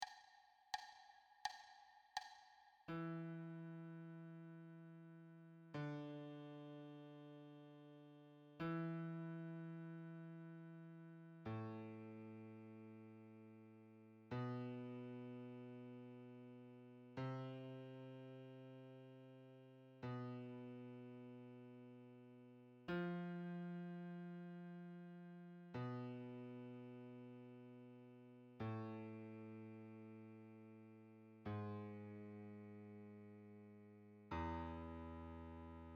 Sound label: wholenotes solo